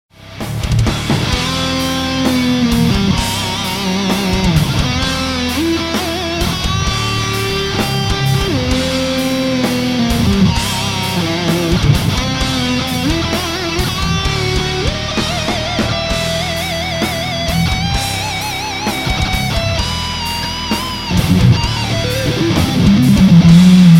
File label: improv E minor